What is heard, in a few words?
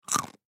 Human Bite Food; Bite Food Chew Single Pistachio; Eating; Blastwave